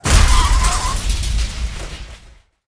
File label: Props Car Bus Flipped